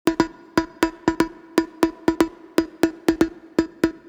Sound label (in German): Klingelton, modular synthesizer; Superbooth22